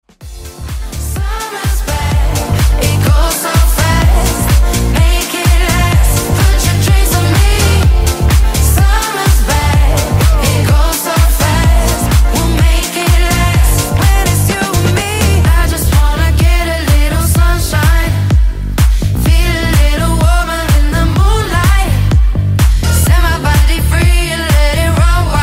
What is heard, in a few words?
MUSIC SOLO MODERN